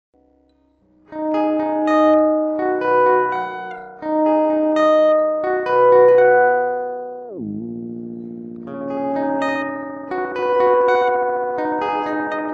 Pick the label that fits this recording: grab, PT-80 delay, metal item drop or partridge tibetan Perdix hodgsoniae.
PT-80 delay